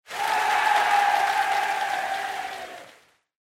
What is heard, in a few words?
Cheer Yay, Low Applause